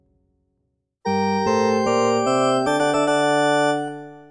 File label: Stage Clear; Key